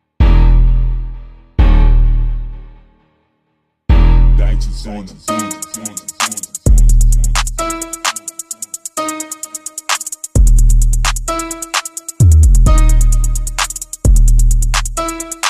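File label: money instrumental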